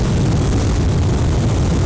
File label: Burner; Falcon 40